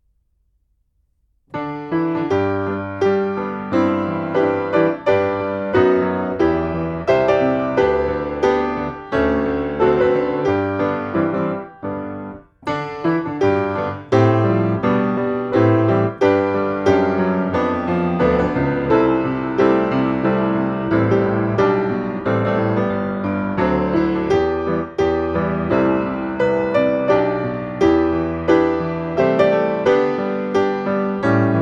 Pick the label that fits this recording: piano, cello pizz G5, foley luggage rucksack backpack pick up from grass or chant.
piano